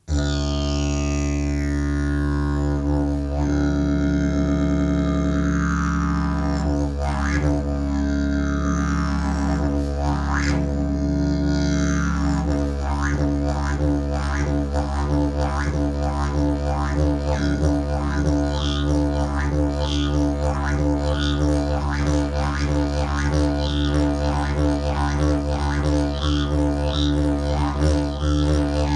jilm, didgeridoo; svalovec

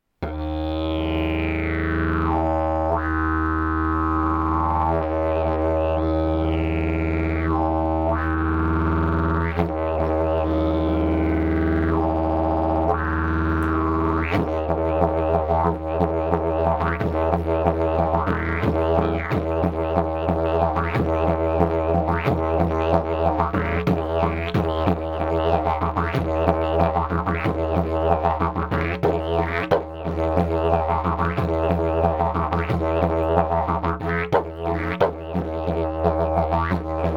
jilm, didgeridoo; jilm had

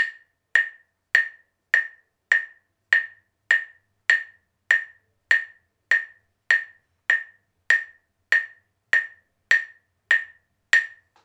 ozvucna drivka claves

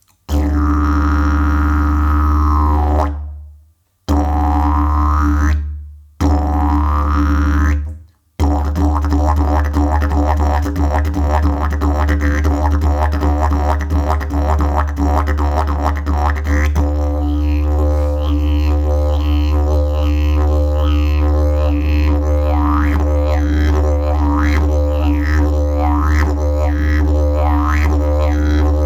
javor koncertni, didgeridoo

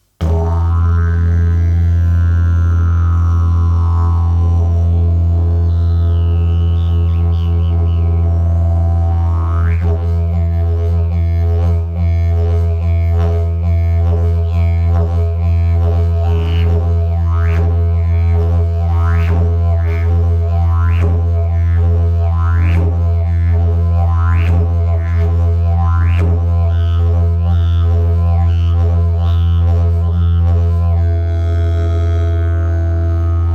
jilm, didgeridoo; jilm hrbohlav

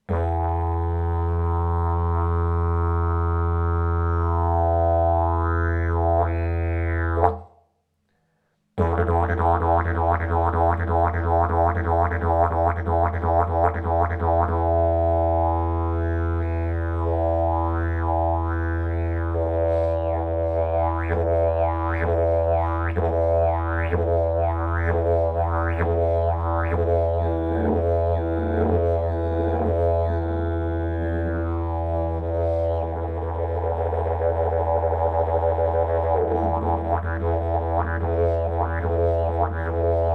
javor samorost, didgeridoo

